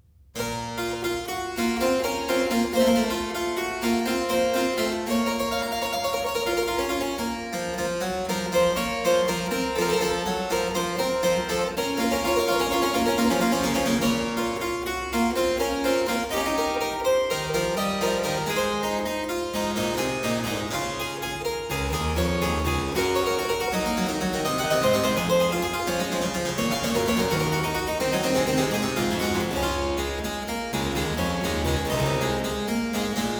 Dreistimmige Inventionen in B minor, Keyboard Works; J.S. Bach; BWV 801; Johann Sebastian Bach; Inventionen & Sinfonias